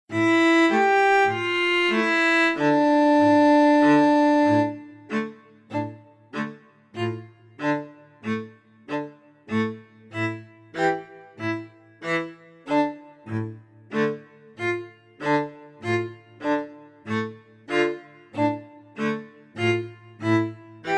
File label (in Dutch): Klezmer festival